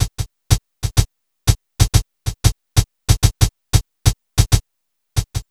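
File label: MPC4 hi-hat; USB Soundscan vol.02; Underground Hip Hop; AKAI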